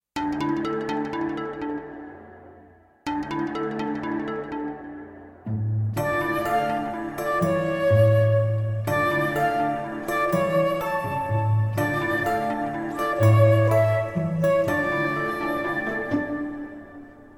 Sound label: Waltz; Super Mario Galaxy